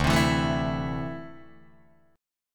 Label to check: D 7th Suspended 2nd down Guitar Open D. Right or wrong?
Right